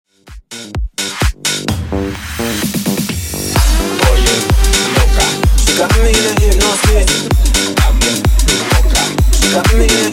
Funk Bootleg Remix 128Bpm

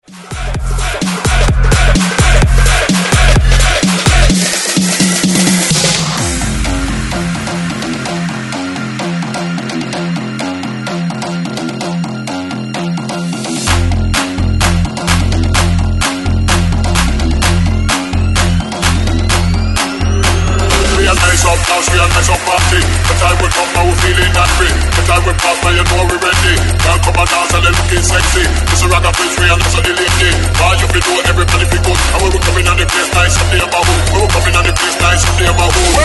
130 BREAKMIX; DJDOLLS

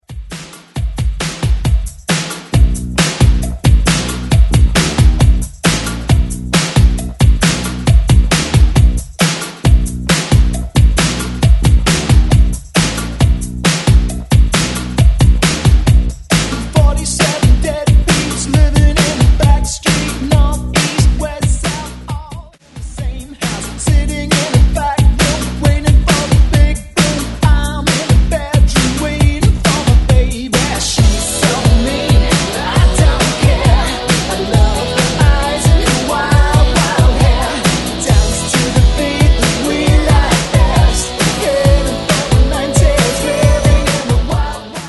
80s redrums

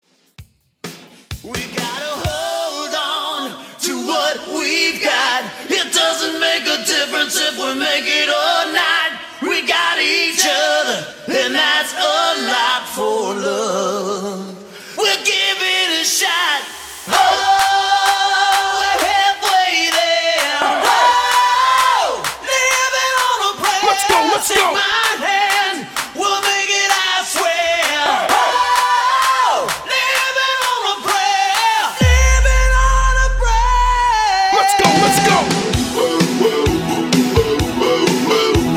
Acap Hype In Party Mixshow 129 BPM

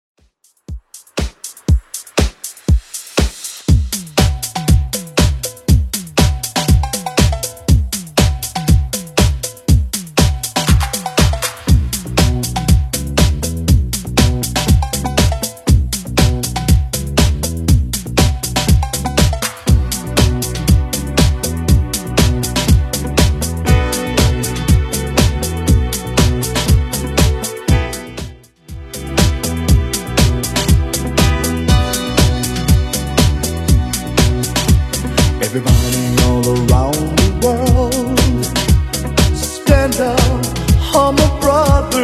80s Redrum 120bpm

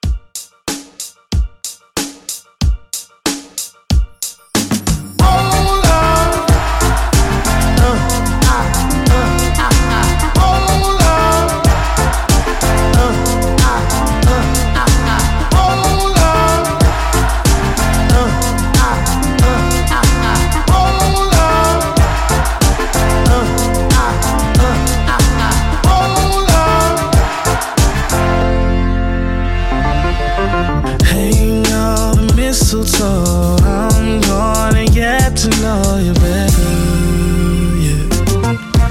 Christmas Hype Re drum 93

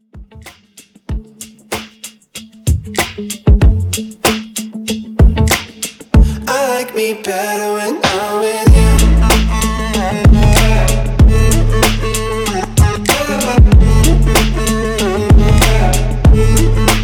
Re drum

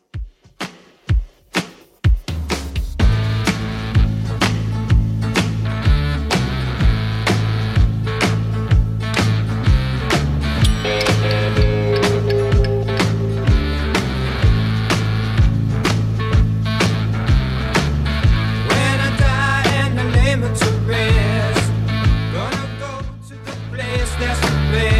60s Redrum 126bpm

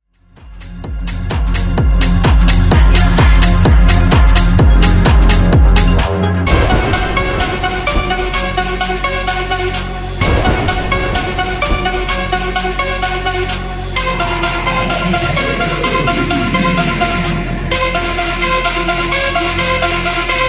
Halloween Bootleg

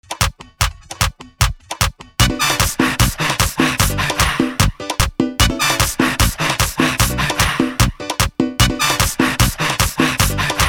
Merengue; Minipack 5 tracks

